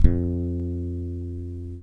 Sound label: DRY BASS, Electric Basses; PlatinumPhattCD2; E-MU Producer Series Vol. 8 – Platinum Phatt